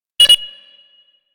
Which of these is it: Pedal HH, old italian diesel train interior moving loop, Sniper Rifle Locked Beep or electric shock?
Sniper Rifle Locked Beep